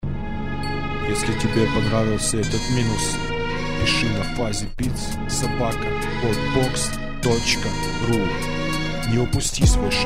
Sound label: romantic